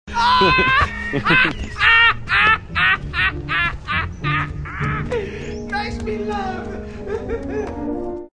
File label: haha